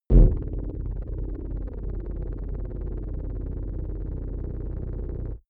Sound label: space warp arrival; raumschiff